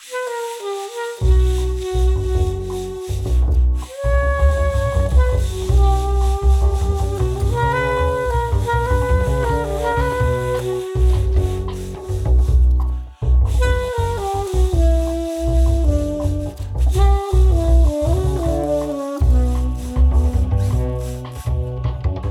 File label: Plasma Dancehall